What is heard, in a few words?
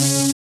SYN, DANCE SYNTH